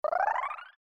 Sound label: swirl; meebit